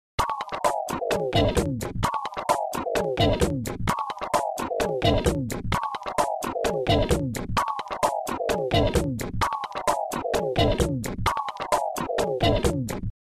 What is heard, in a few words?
jingle; LaTele